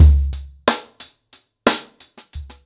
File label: 90BPM, ACOUSTIC DRUMS